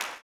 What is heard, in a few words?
CLAP, Blues Soul; Spectrasonics Vocal Planet